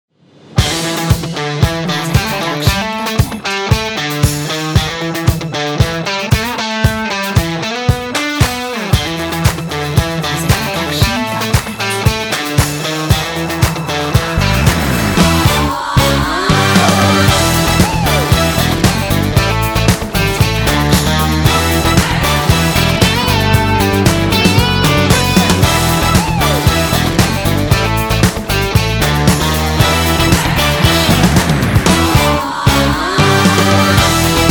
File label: Upbeat and Uplifting Disco Funk Rock